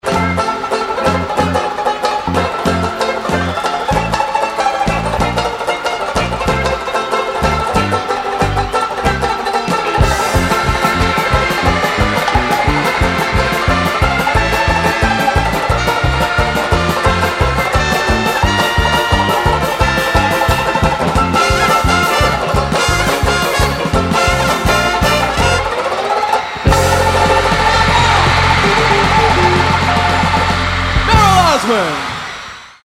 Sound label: Banjo Medley